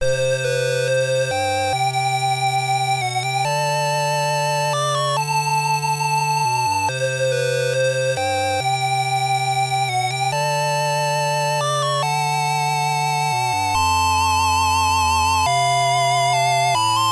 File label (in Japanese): ゲームオーバー PSG版; Xak: The Tower of Gazzel; MSX